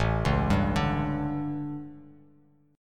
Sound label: A 11th A down, chord; arpeggiated